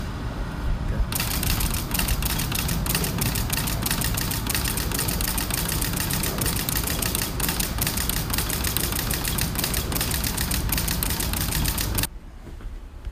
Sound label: Fast Pulse